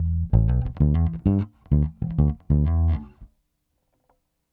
Bass, 70s Analog Funk; Sonic Foundry (Sony Creative Software); What it Is!; Slinky's Place

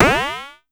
Pollard Syndrum

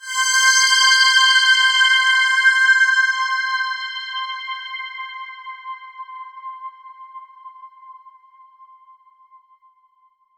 Roland JV 2080, interval; MG INTERVAL

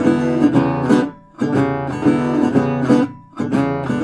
Blues Guitar Samples, riff; ILIO; Fretworks; SIMMS RIFF

